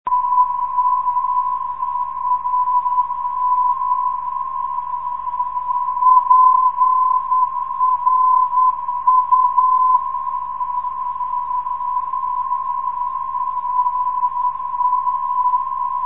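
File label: rm 398khz, non-directional beacon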